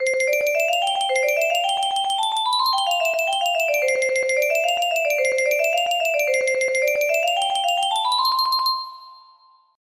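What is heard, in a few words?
music box melody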